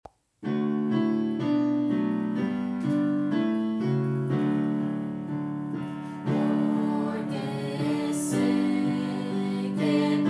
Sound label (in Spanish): Porque sé piano